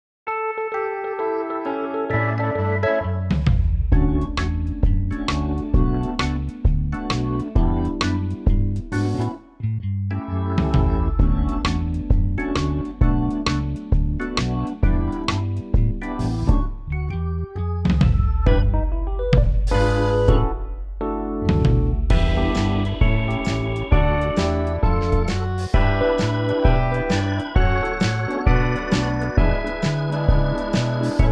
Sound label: Busy Tonight backing track